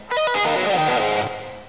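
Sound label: octave arp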